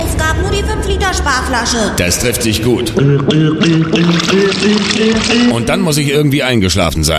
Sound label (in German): 5 Liter Spar-flasche